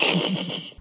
laugh

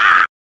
"ah", Voice

